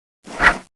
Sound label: Enchanter Attack Sound; Heroes3